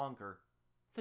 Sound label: voice insect; Speech-enhancement